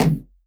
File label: Elektron Monomachine, Drum Machines